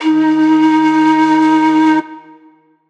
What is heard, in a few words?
One-Shot D#, Melodic; HipHop; Hip Hop and Trap; Windpipe; Ghosthack